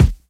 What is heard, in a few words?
BD, Dance Drums; Best Service ProSamples vol.15; AKAI